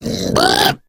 female warning; video game; source engine; left 4 dead 2; boomer; voice

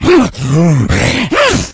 rage, infected, male

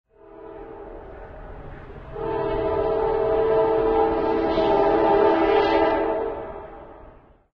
train 1shot, harbor; Source Engine; tf; cp harbor

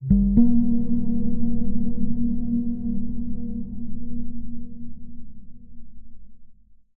editor blips; Source Engine; Portal 2

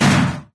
door hit ground; video games; source engine; episodic; intro; dog

